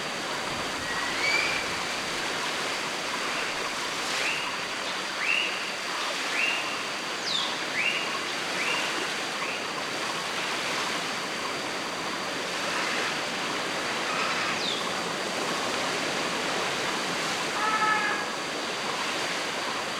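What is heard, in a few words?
Ambience Caribbean Outside Loop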